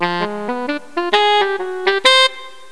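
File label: SAXOFON, saxophone; Pipo-Aprende musica con Pipo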